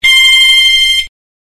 cerberus pyrotronics mtl s15 s single chime

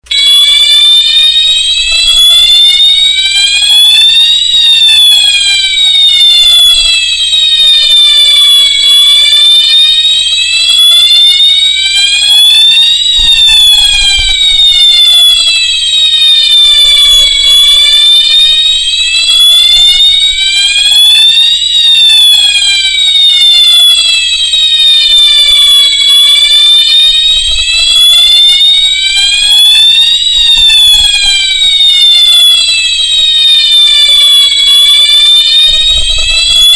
cerberus pyrotronics mtl s15 s siren

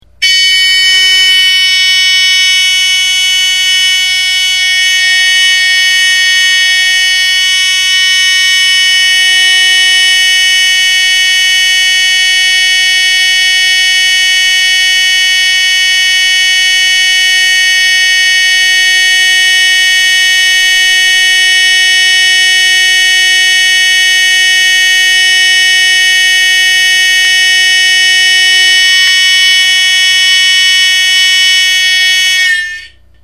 pyr a larm hdc 24c, alarm

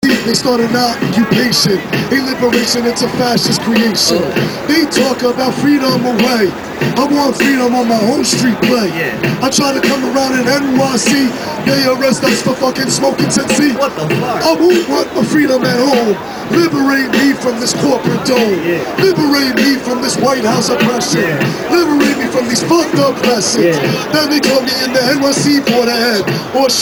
political rap

